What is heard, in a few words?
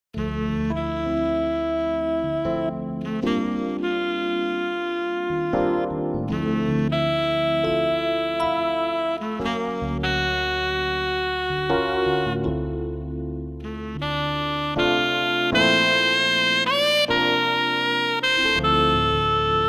movie tenor, ketron audya; bati ses ve ritim tanitimi